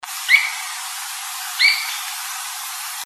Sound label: Flycatchers; AZFO; Wolf